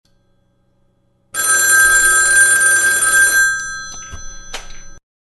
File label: Phone Ringing